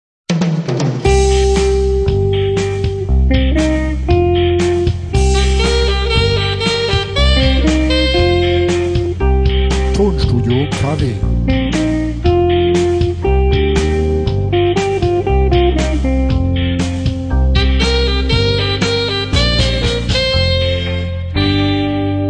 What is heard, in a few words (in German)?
Werbemusik